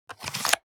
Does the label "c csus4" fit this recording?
No